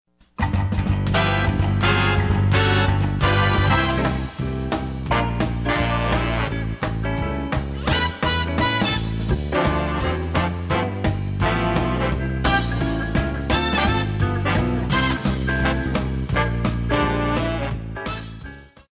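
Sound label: swing big band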